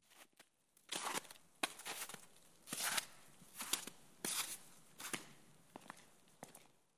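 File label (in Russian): шаги по обледеневшей луже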